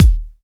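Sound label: HIPHOP bass drum; s950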